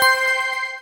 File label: PUZZLE Success Bright Voice Two Note Fast Delay; stereo; Universal Sound FX